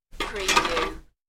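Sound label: Ice Scoop Put Down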